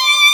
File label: violin; osu